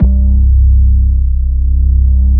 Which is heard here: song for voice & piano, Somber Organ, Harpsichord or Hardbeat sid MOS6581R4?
Somber Organ